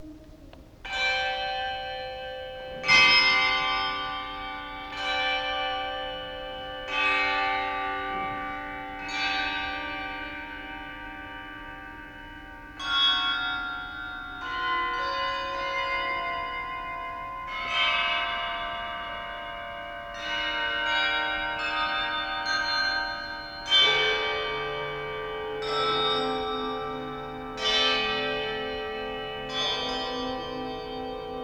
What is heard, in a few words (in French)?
Suite pour cloches Carillon